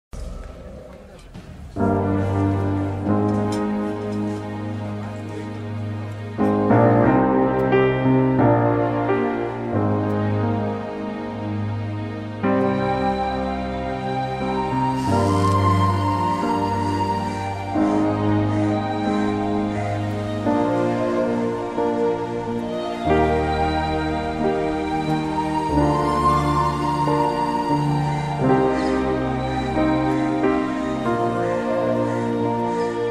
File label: bgm ringtone tamil sad bgm tamil love bgm tamil bgm